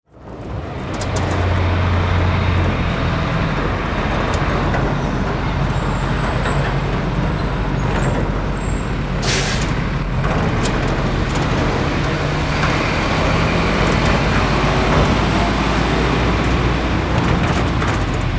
Garbage truck moving back and forth; construction vehicles

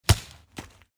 Bullet hit body impact

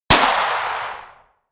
Pistol shot distant perspective

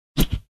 Punch swing

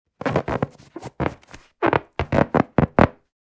Inflatable toy creaking surface squeak

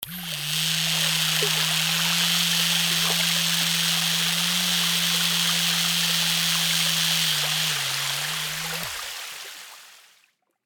Toy boat in water remote controlled; rc toys sound effects

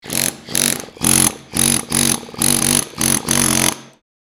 Jackhammer drilling short breaks

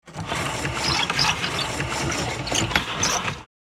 Blind crank raise up squeaky rattle; blinds; gfxsounds

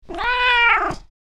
Playful kitten meow cat meowing; animal

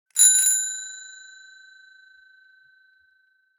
Metal bicycle bell single ring; vehicle

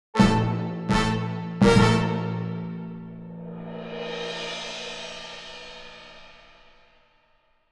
Game over you lose epic

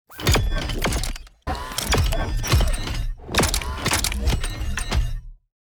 Sci-fi repair kit cybernetic futuristic

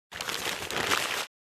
Garbage bag rustling handling

